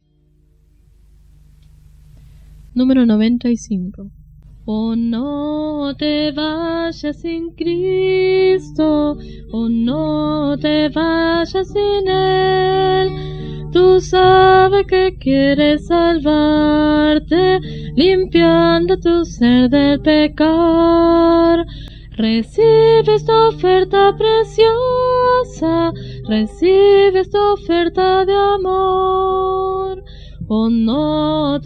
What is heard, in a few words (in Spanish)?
Coro, himnario niños